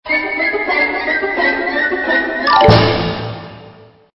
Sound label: Stinger; STINGERS